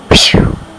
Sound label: whew; People; Sound Library 2000; Wayzata Technology